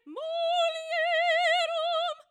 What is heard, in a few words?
choir